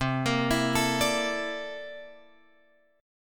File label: C 13th C; C13 Chord {8 X 8 9 10 10} arpeggiated